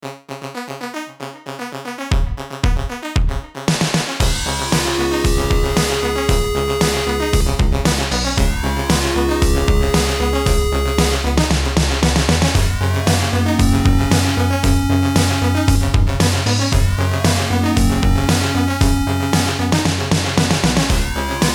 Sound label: synth expander module